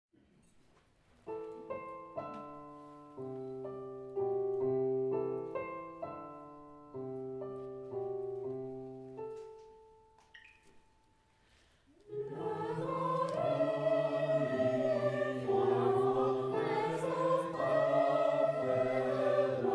Pavane; Ravel

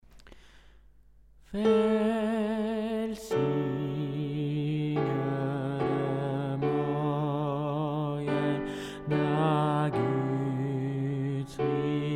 velsignede morgen altos

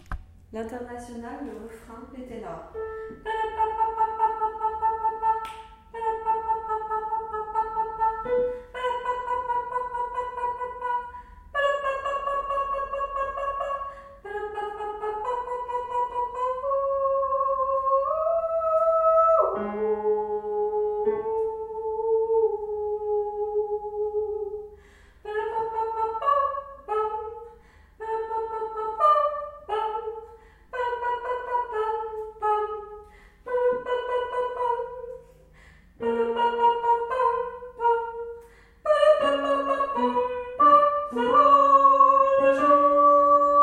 internationale tenors refrain

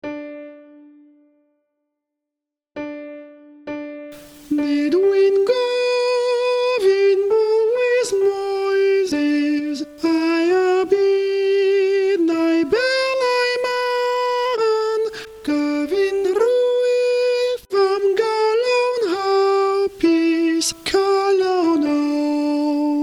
calon lan soprano

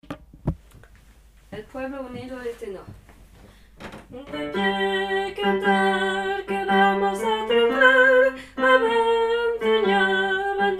tenors

